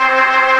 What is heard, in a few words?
Organ, MortStrg+WurlVox